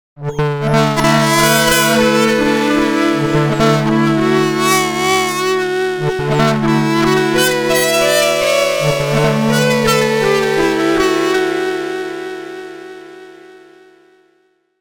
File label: Soniccharge Synplant 2 Fairlight